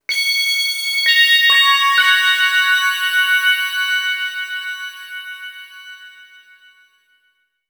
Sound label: Bell; Sonic Foundry (Sony Creative Software); James Johnson Spektral Minimalism; Sublime Keys; Bells